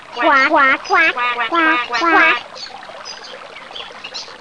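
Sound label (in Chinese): yazi jiaosheng, duck quacking; jiachu jiaosheng; jiaqing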